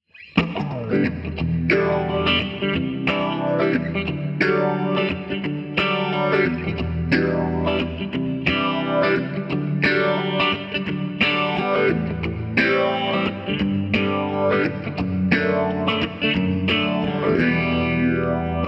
X-VIBE STONE; xgear

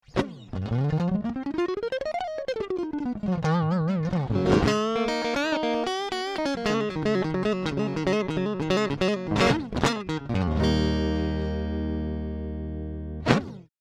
Furious unproc; fender

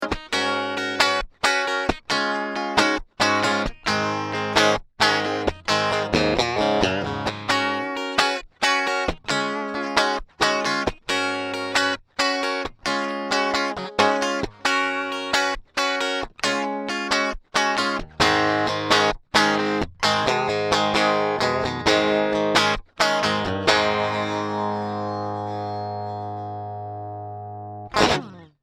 Rock n Roll 600 + Fuzz; fender

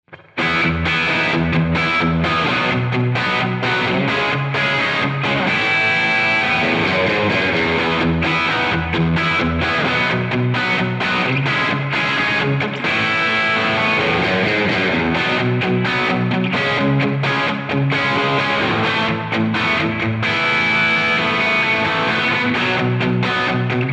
X-SPACE ROOM; xgear

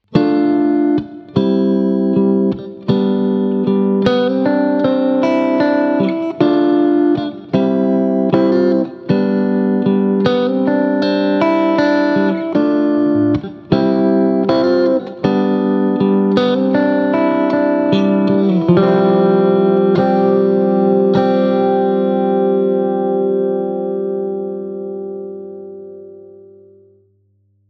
Fender 2 Custom Champ Breakup; AmpliTube; 真空管アンプ

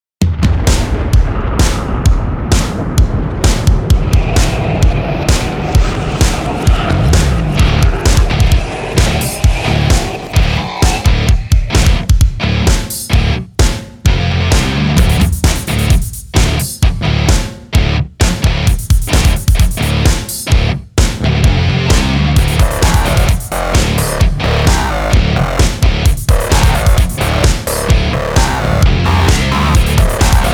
Crossover Rock; stealth limiter; Competitor

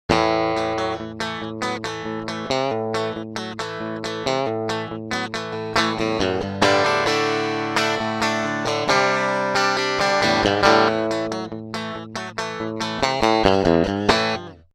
Pro Junior unproc, fender

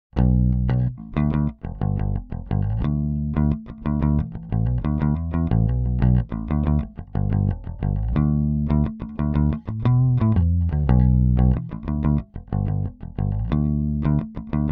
Cool Pick unproc, fender

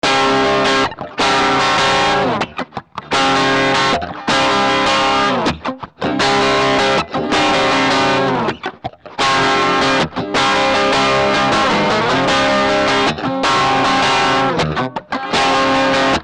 Crunch Chords, MultiFX; iRig UA

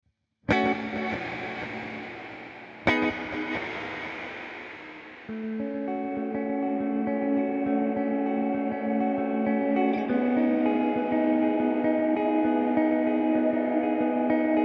X-TIME REV PONG; xgear

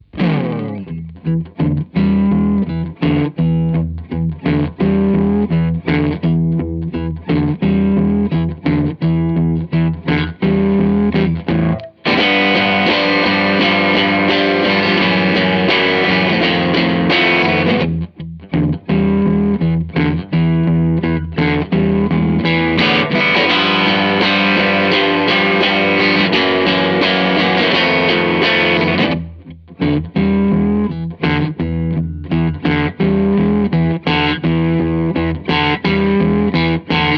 Bassman Worn Out Blues; AmpliTube; Fender 2; 真空管アンプ

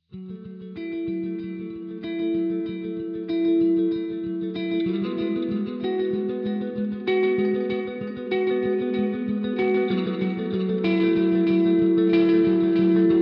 X TIME DUAL; xgear

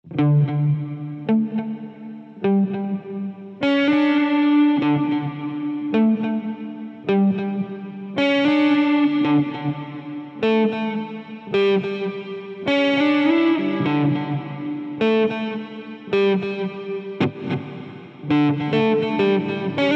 X-SPACE REVERSE; xgear

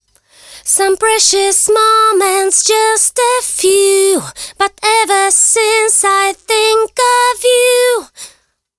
de-esser 3 band female vocals

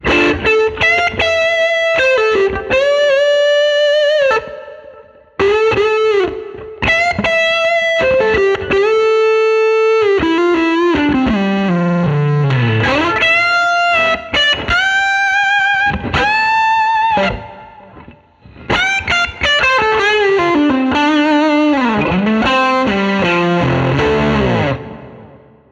Custom Pro Lead; AmpliTube; Fender 2; 真空管アンプ

